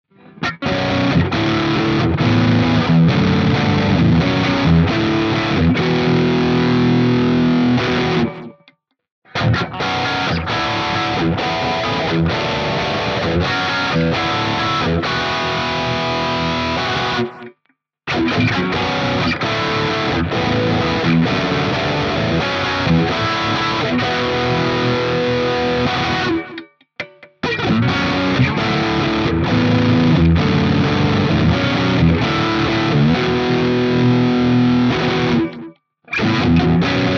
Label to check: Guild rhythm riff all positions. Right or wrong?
Right